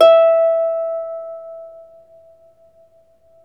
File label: Troubador Harp